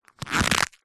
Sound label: household cup plastic empty squeeze crush; zapsplat